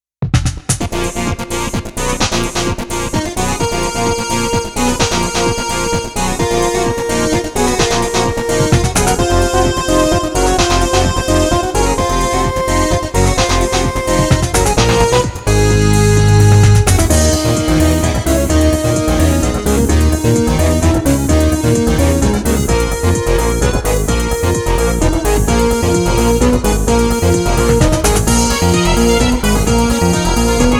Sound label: techno